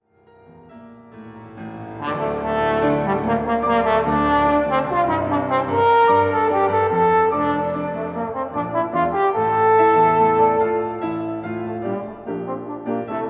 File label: Concertpiece for Trombone, trombone and piano